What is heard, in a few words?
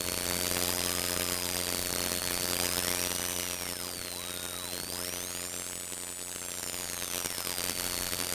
Radio static